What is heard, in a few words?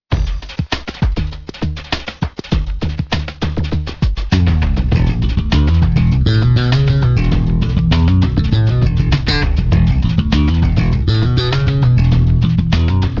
V Bass